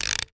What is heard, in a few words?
leisure toy plastic wind up; zapsplat